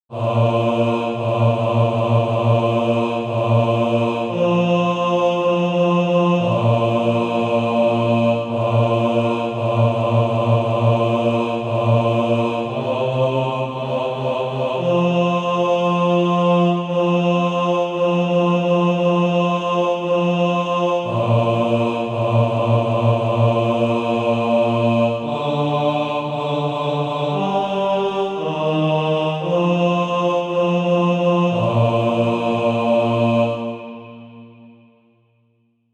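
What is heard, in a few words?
bass, SATB Practise Music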